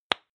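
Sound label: lipstick open hard, Bathroom; haus-garten; badezimmer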